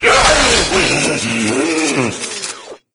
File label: melee attack; doom3; sabaoth